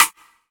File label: Rimshot, Snares